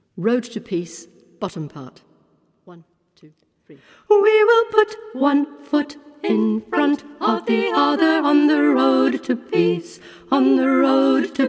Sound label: SAB bass